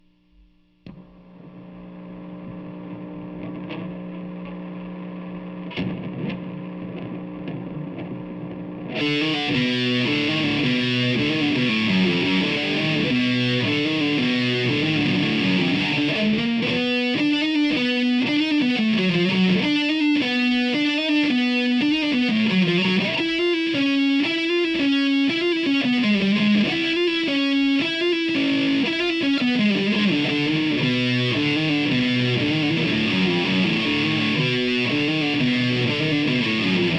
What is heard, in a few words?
GTR